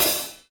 Hat Semi Open Soft; hydrogen drum samples

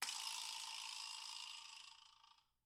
vibraslap; Idiophones; Struck Idiophones

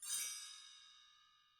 bell tree scrape; Idiophones; Struck Idiophones

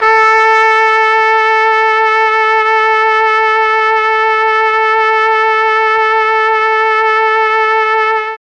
A2 TRUMPET, mellotron; CHMB TRUMPET

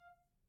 Grand Piano Main Release F4, Kawai; Chordophones; Releases; Legacy

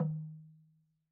Log Drum Hi, Slit Drum; Idiophones; Struck Idiophones

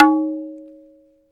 tabla na o

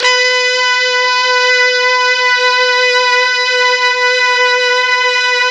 B3 brass, mellotron MKII

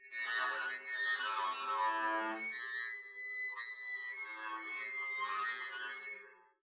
arco noise extra high moaning, double bass; dsmolken; noises

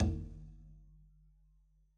pizz noise low thump, double bass; dsmolken; noises

